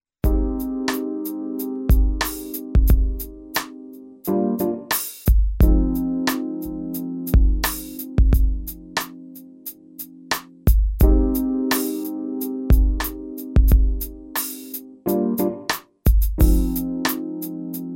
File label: Jazz